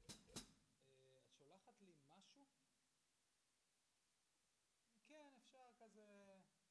SNR Top

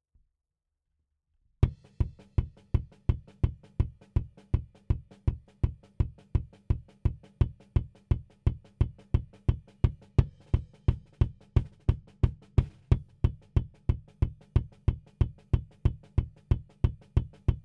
Kick In

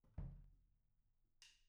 ROOM

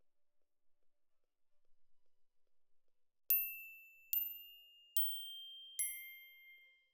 Glock Close

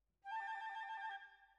Bassoon, Jazz for piano

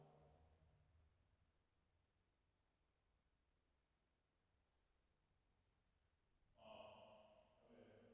480 Rev

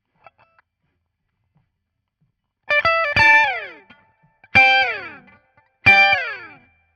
Guit Solo, RNR for piano

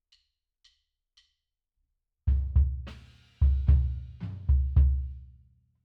BD In

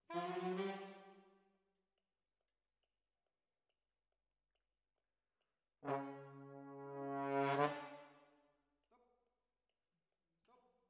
Trombones, jazz for piano

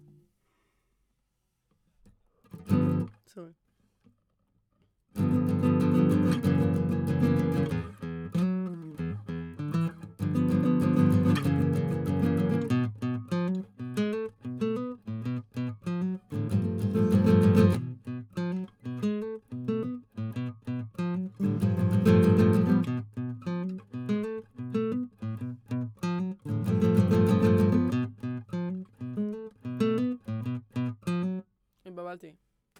GTR

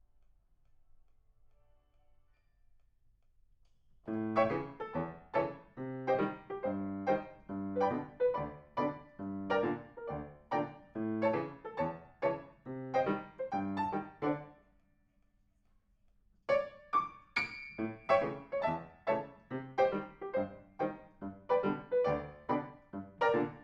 PIANO

